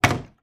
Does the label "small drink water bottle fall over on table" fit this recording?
Yes